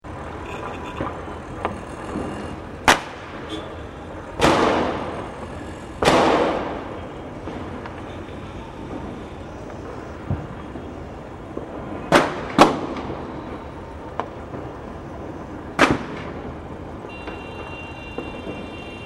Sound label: Diwali crackers, India